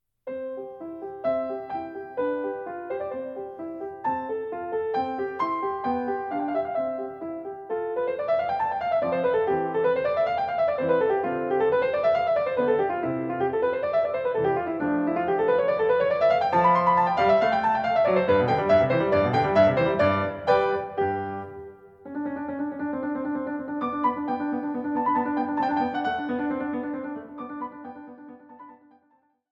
Piano Sonata No 16 in C Major K 545 Semplice - I Allegro